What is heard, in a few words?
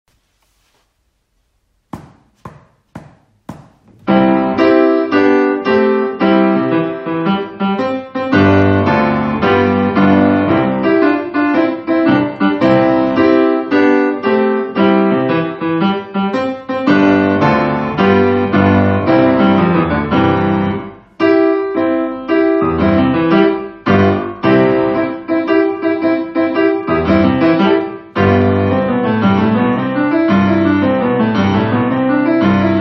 piano duet Sicilian hunting song exam speed; Grade 7; teacher parts